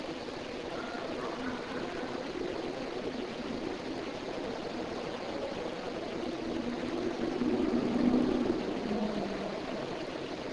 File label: laugh